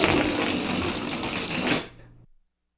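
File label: balloon deflating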